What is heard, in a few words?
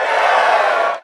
cheer, basketball; Nickelodeon Basketball Stars 3; ThrillGames